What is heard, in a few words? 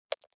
Click Sound